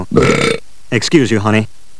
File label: burp; Burp! Excuse you